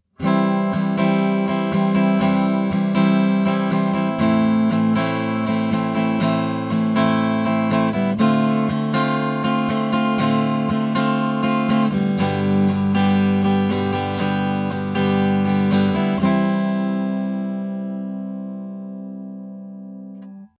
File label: godin aura; Multiac Jazz with Aura Spectrum DI; DI直接